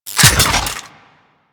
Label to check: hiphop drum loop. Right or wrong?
Wrong